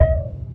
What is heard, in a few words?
E-Perc Blop Grounded, Electronic Percussion; Drums; Ableton Live 12 Lite; Core Library